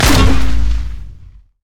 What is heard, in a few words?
smoke grenade; LoL; graves